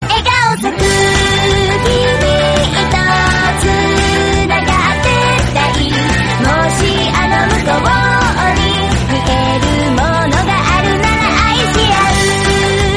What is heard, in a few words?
japanese song